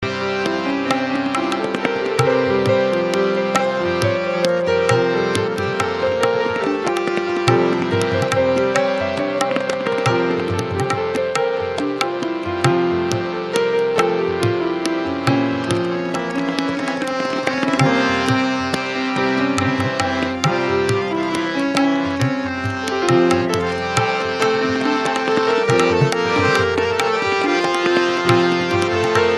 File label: Instrumental Bhajan